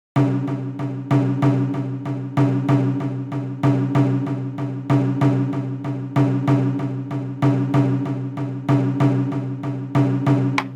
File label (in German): dotoh begleitung 95bpm